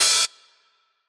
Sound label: hihats open horns